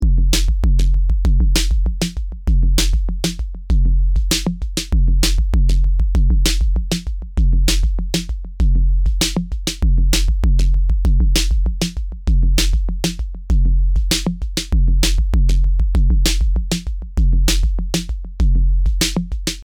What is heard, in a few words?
drum